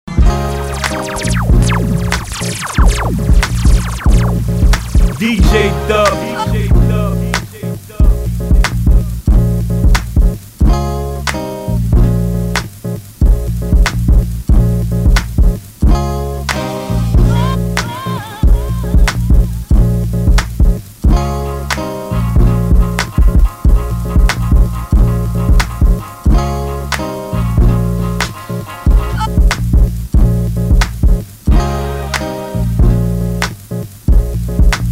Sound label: Beat